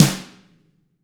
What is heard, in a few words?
tama hit; ILIO; Double Platinum Drums 1